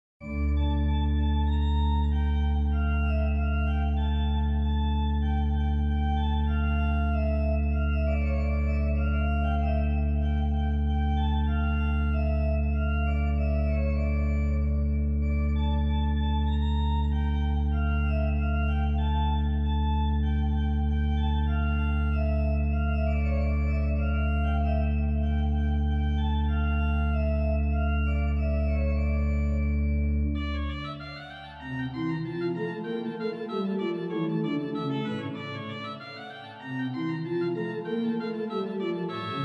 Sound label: Messe Noel, OrganMusic; Fessy & Mine; Souvigny